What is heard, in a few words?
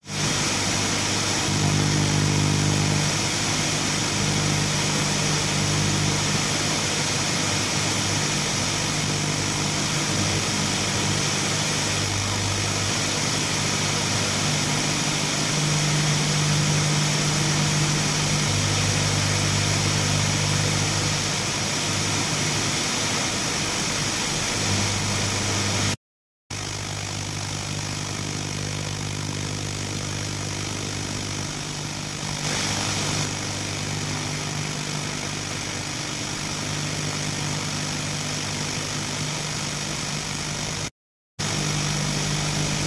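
TV Tuning Noise Bad Signal, static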